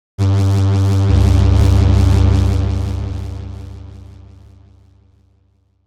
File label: bass